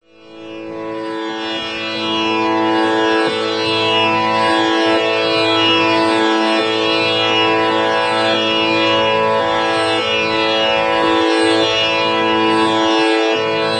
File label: tamboura